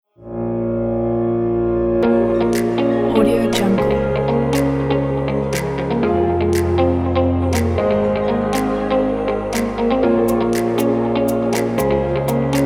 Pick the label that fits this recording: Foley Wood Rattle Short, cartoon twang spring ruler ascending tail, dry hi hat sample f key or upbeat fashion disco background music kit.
upbeat fashion disco background music kit